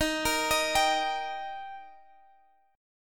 D sharp Major D sharp Guitar Half Step Down, chord